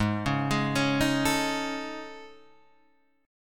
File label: A Major 7th Flat 5th